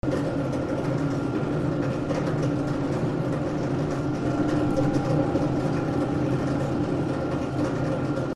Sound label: Conveyor Belt; Bunnicula - Crop Control